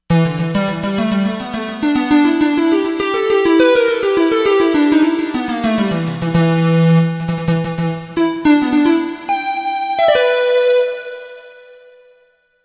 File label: ethno lead, Korg; Korg MSC-13S Ethnic 2